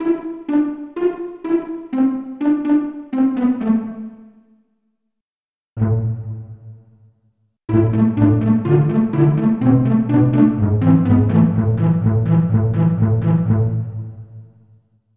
pizzicato section

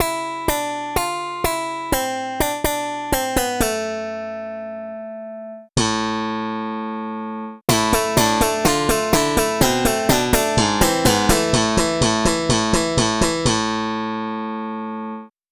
pop bass

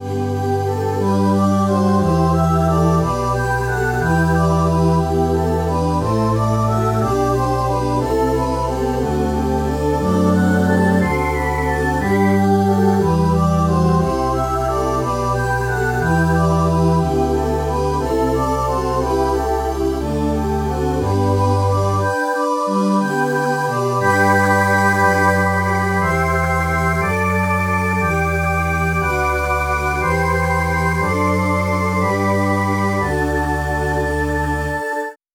synth voice

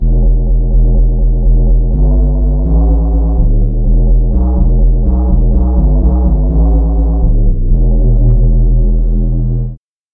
ocarina bass samples

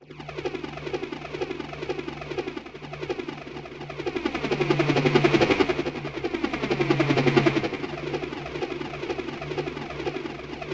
helicopter

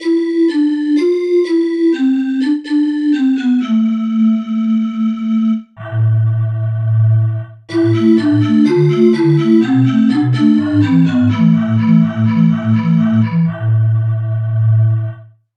bottle chiff

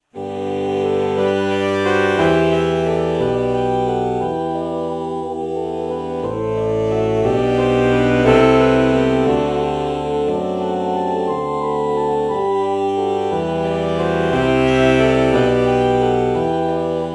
alto saxophone oh morph

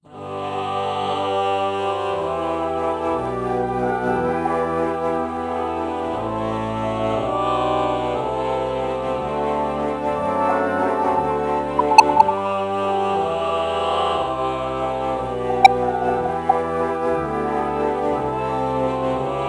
alto flute ah morph

